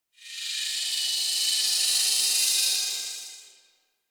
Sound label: Sword Pulse; Cinematics; Warframe; Orbiter Cradle